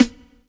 Snare, Afrobeats; Drum One Shots; Afrobeats Drum Kit; Lagos; Cymatics; PARADOX II

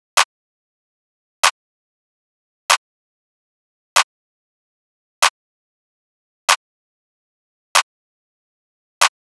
Drum Loop, 95 BPM Clap, West Coast; Loop Stems; Cymatics; GHOST (Beta Pack); Alameda

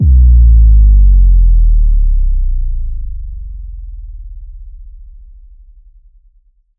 Impact Sub Drop; One-Shots; SFX; Ghosthack; Color Bass Essentials

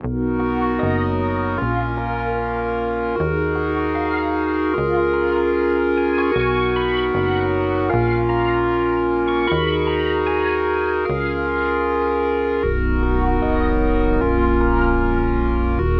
152 BPM D# Min, Vintage & RnB Melodies; Mosaic

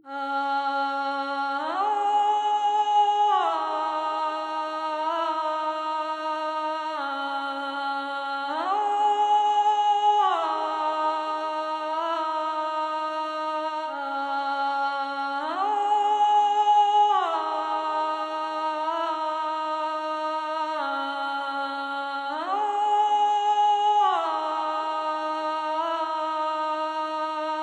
139 BPM C# Min Vocals; Melodies; Loop Stems; Cymatics; Monarch Melodies; Orchestral; The Mist

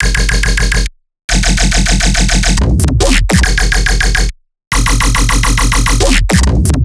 Riddim Bass Loop 140 F#; Dubstep; Riddim Basslines; Artillery; Ghosthack; Advent Calendar 2019 Day 17; Riddim Bass Loops

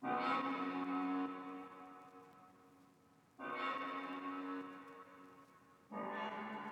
Various Melody Loop - 143 BPM F# Min FX; Melodies; Loop Stems; Cymatics; Oracle Sample Pack

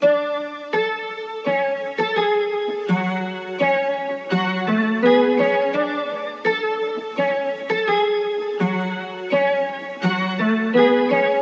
Various Melody Loop - 168 BPM F# Min String; Melodies; Loop Stems; Cymatics; Oracle Sample Pack

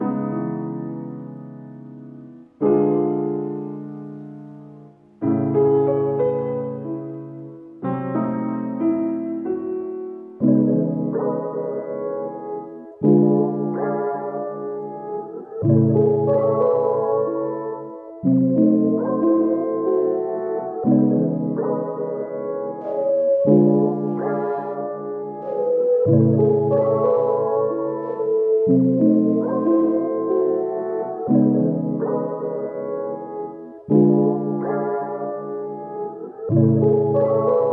Ballad - 92 BPM C# Min, Vintage & RnB Melodies; Cymatics

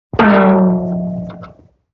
Can Hit; Vintage Cartoon; Drums & Percussion

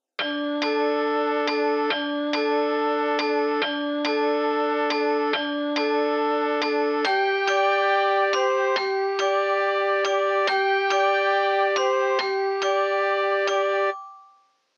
140 BPM D Min Mallet; Melody; Loop Stems; Drill; Cymatics; 2022 Melody Collection; Smash

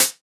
Closed Hat; Ghosthack Advent Calendar 2017 Day 5

